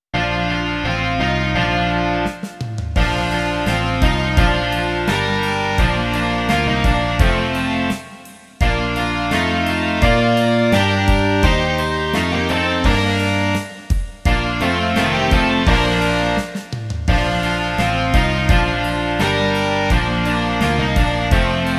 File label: rock